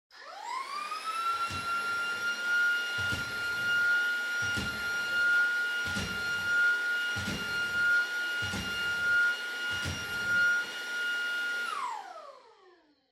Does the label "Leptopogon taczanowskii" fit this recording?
No